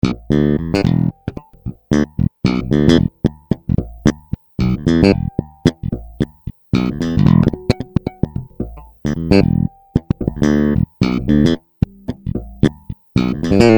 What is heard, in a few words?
bass test